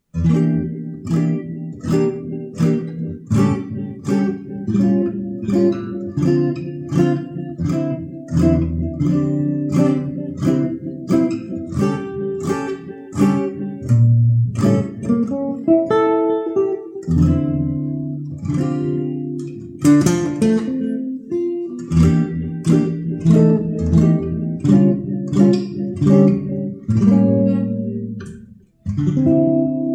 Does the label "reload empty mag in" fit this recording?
No